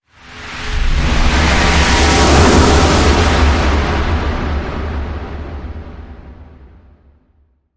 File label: Technological Transition